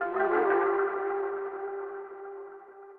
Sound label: ethno, 160, C